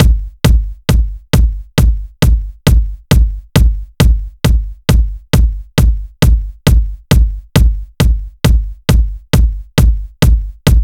135 Kick; Zero-G Trance Inducer; 90 s Sample CDs

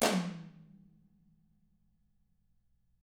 DW 8 HIT, Double Platinum Drums; ILIO; Double Platinum Drums 1

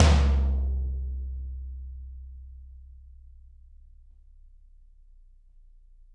Toms, Drums; Bob Clearmountain Drums I

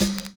SLOWPITCH

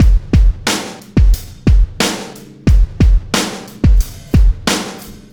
Landing Party Beat, Processed Drumkits

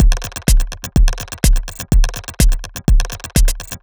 125bpm, House Drumloops

